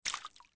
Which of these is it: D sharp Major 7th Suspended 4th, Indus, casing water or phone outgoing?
casing water